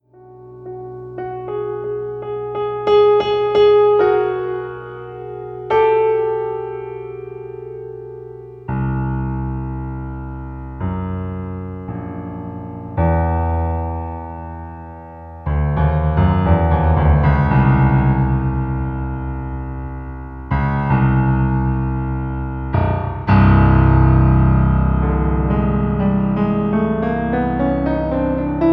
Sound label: Piano